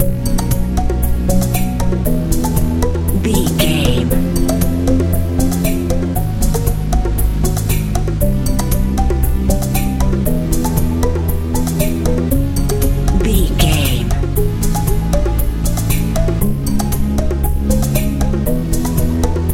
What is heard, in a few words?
nice fun dance pop